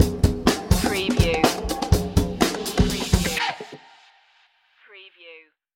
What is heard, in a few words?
b-boy hustle stinger